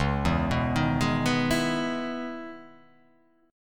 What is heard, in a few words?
C sharp Minor 9th C sharp, chord